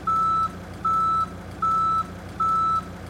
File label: fire truck; Golden Crayon Sounds